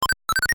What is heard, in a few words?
egg; mailbag; devilworld